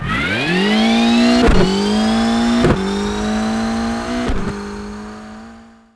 Saxo, tarmac; Colin McRae Rally 3; XBOX

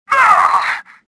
pain; stofficer

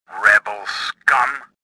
anger; worker2

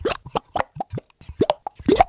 tar; Ambient; MX vs ATV Unleashed

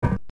clank, footsteps

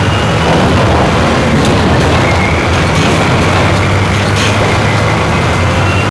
container truck driving; docks; Max Payne

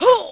fall; Splashdown; XBOX

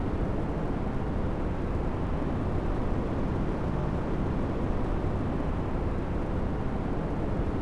waterfall plaza ambience; Star Wars Obi Wan; XBOX

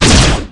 Laser Fire; Space; Ranger

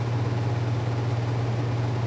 air conditioner, ambience; Soldier Of Fortune II Double Helix; XBOX

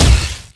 Cosh Impact, Electric Cosh

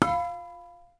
pipe hit wood; impacts explos

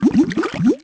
drown DMG; The Elder Scrolls III Morrowind GOTY; XBOX

